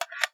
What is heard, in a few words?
m4 stock, weapons; m4a1; garrysmod